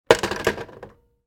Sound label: phone hang up